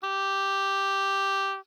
Oboe ff G4 stereo; Woodwinds

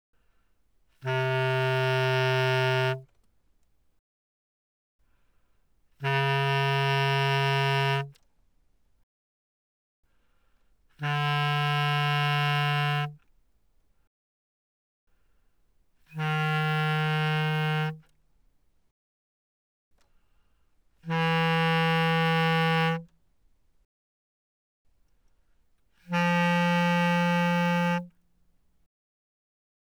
Bass Clarinet ff C3 B3, woodwinds

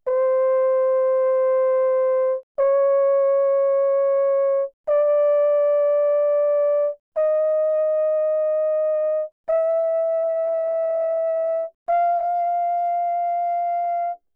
Horn, pp, C5 F5, french horn; Brass

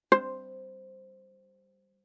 Viola pizz ff sul A C5; MIS Pitches - 2014; Strings

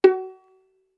Violin pizz ff sul G Gb4; Strings

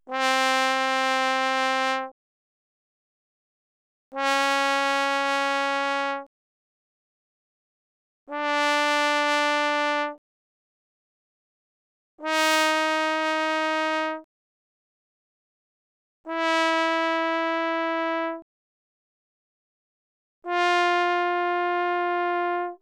Bass Trombone ff C4 F4; Brass

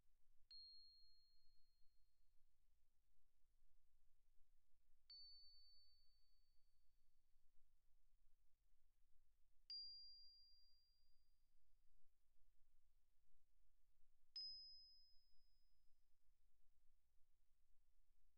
bells, plastic, pp, C8 E8; Percussion

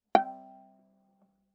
Viola pizz ff sul D G5; MIS Pitches - 2014; Strings

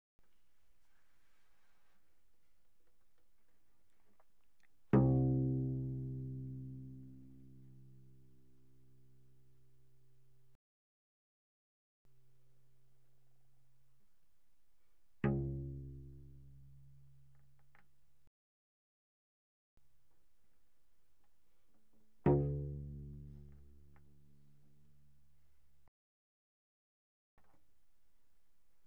Cello pizz mf sul C C2 B2; Strings

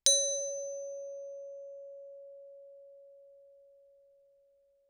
bells plastic ff Db5; Percussion

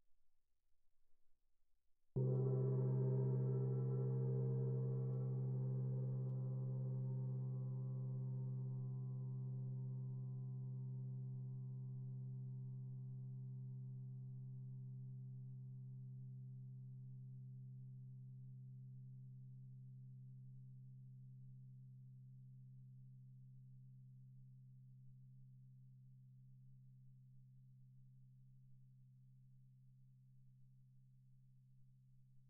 tamtam mf, Percussion; gongs & tamtams

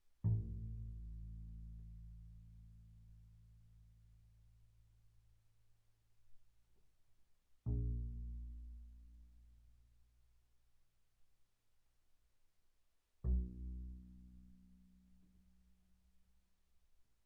Bass pizz sul A pp A1 B1, double bass

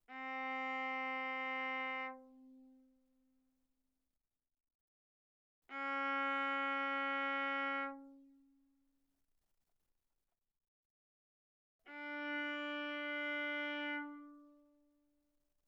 Viola arco sul G ff C4 B4

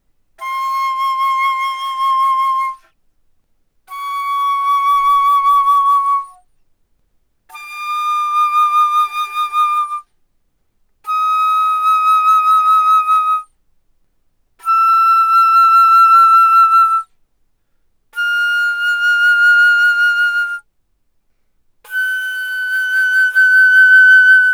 Alto Flute ff C6 G6, woodwinds

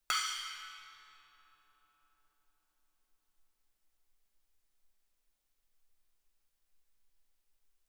splash stick bell ff, suspended cymbals; Percussion

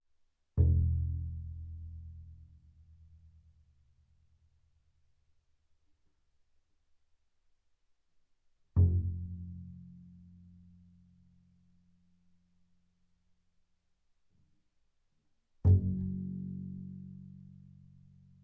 Bass pizz sul E ff E1 B1, double bass

